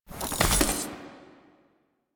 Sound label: Sticky Bomb Unequip; Grineer; Veilbreaker; Vor